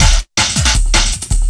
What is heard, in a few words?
LOOP, 160 BPM, Drum & Bass